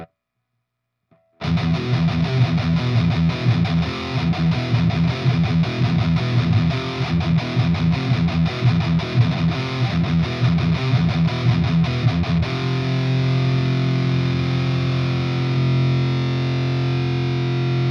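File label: EL84, guitar; GR56 Tung-Sol ULTRA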